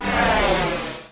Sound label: whoosh